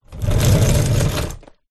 industrial mechanic garage creeper dolly wheels roll floor